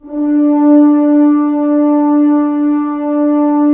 Horns; Roland - LA Composer vol. 4; HZ Horns

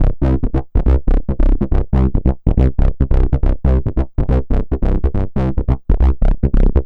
Trance Bassloop 140bpm, Bass Arps

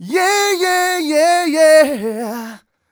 128 uh e-flat, mal, Vocals

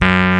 DRHODES; E-MU Formula 4000 Series Vol. 5; Protozoa; Vintage Presets

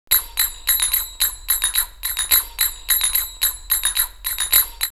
Riyadh 108, drumloops World & Ethnic; X-Static Goldmine

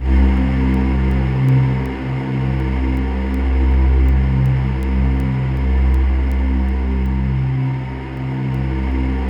C2, Synth Leads

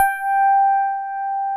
TX 802

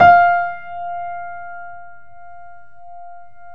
PIA GRND, Roland; Sound & Vision; Gigapack I CD 2; PIA GRAND 2; PIA Grand 6

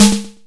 snare, Korg Electribe ER-1; Kit 20

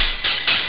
acid hhat rip; Amiga Soundtracker Sample Packs

